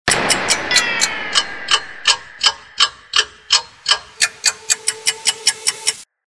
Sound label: slow time